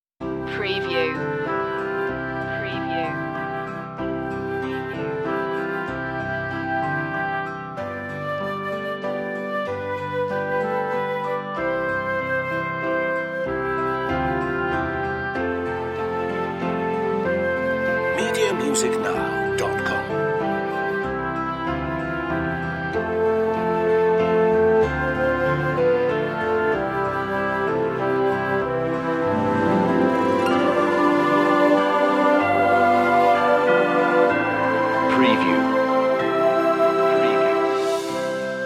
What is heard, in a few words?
orchestral; silent night; media music now